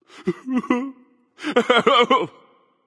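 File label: nonverbal boohoo; smite; voicepack; merlin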